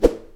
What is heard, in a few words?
Sword Or Bullet Swish Swosh